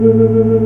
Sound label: Organ, Barton Tibias